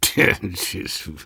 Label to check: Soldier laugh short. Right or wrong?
Right